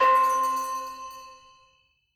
Scatter Reel Stop; Wu Xing

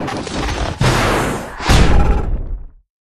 bonus giga collect all; Recode; rampage riches

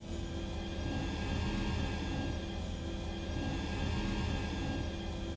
Reel Spin Loop; Mahi Gaming; forbidden Throne

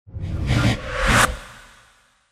Exit Freespin; medallion Desktop; Fantasma

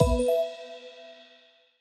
scatter landing attention; riviera Star Desktop; Fantasma

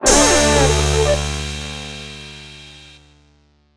swirl; astro Legends Lyra and Erion Desktop; Foxium

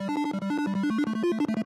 reel Spin; Diamond Empire

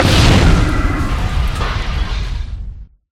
building destruction melee; Recode; rampage riches Desktop

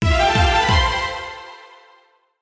Win; genesis; euro Golden Cup Desktop

